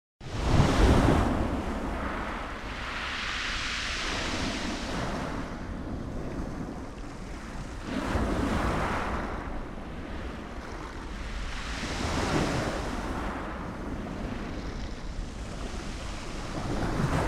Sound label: beach; Brighton